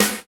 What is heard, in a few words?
snare BASSY, Roland; Roland L-CD701; Snares 6; Sn Modules 6